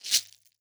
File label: Cowrie Shekere, World Percussion; Cymatics; Emperor World Percussion